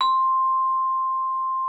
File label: VIBF C6, VIBES; Xsample VOL-4